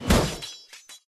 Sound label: Generic Combat